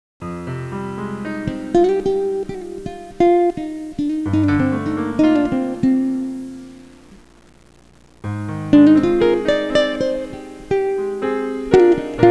suonerie musica jazz

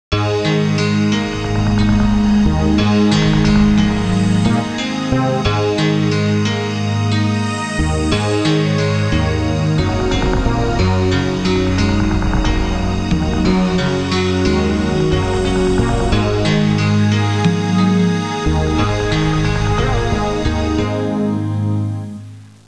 musica elettronica

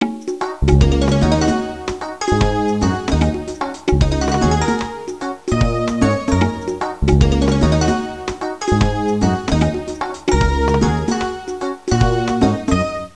suonerie contemporanea; musica contemporary

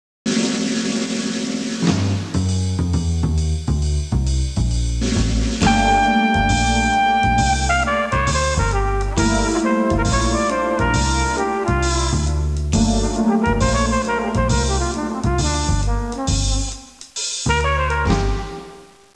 suonerie musica jazz

